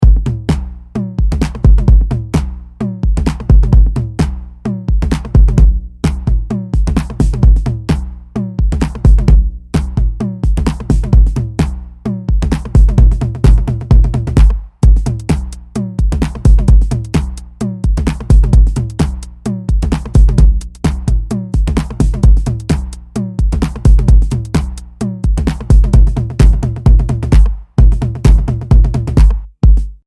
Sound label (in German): TR-909 pur